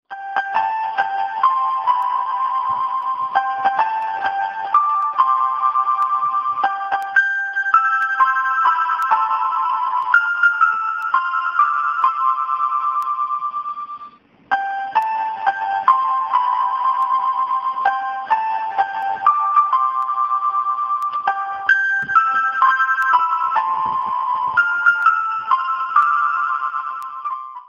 happy birthday ringtone

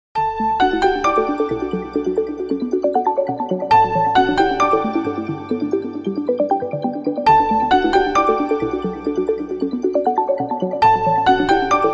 huawei living tune ringtone; android

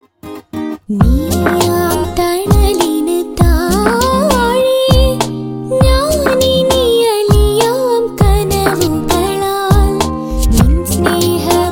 malayalam ringtone

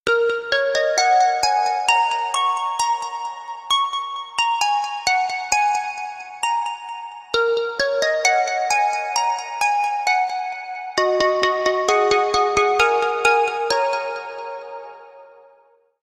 chinese mobile phone ringtone

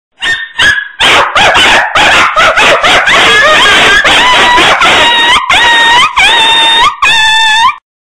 dog barking ringtone; android

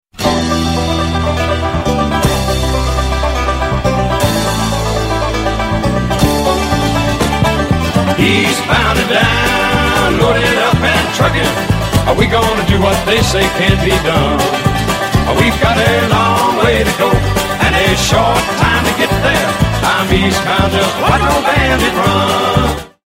cool country music ringtone

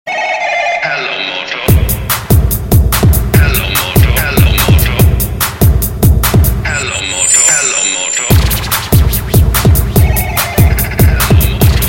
Motorola Trance ringtone

